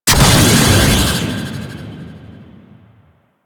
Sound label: Stealth Boost Thruster; Warframe; Vehicles; Archwing Stealth